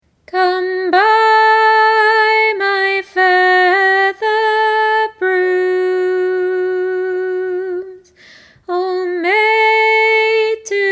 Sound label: Street Cry Feather Brooms; EFDSS Marylebone Resource